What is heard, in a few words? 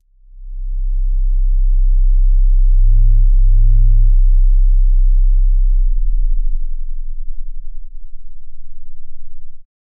minimal bass pulse low